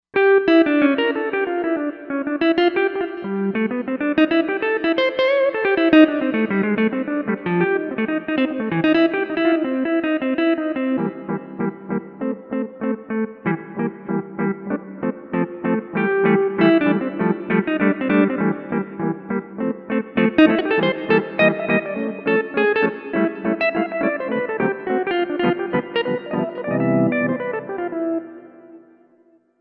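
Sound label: Guitar Green Lead, synth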